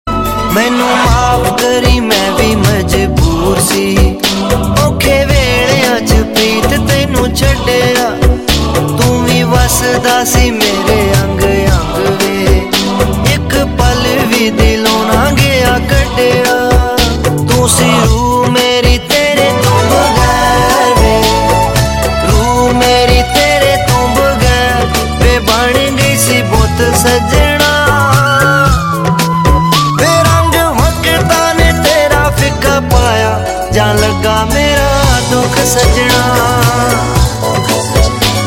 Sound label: Punjabi New Sad Tones